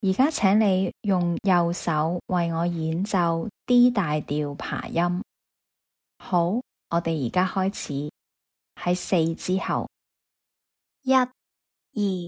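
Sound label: D major arpeggio right hand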